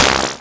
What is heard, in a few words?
Blurrkilrkurgurk Snare, MultiMoog